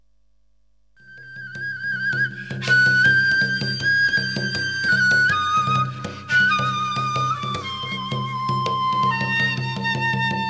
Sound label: dnb